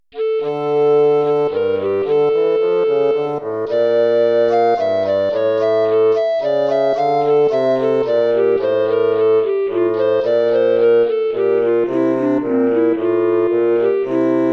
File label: courante